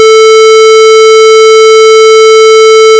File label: square